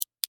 Lamp Switch Click, Household, Lamp, Switch, Click, Slow